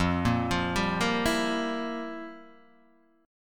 F Major 7th Suspended 2nd Suspended 4th, F, down, Guitar, Open D